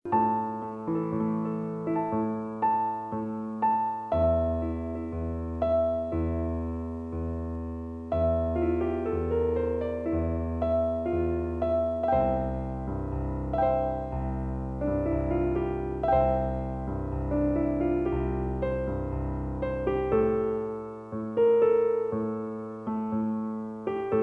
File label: Piano